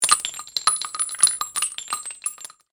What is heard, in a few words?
gun shells; MK9; Jax